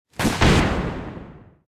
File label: Skill Head Shot; starbites